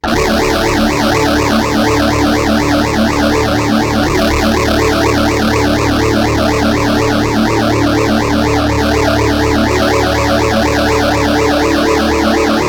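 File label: rat guitar pt9pro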